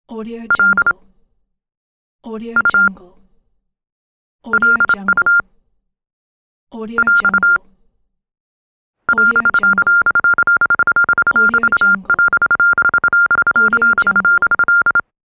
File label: morse code signal over radio